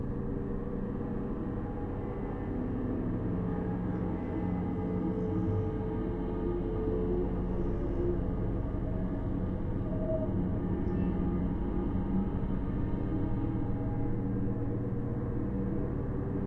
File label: cemetery; portlux